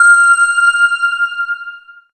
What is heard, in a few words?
Alien, Akai MPC5000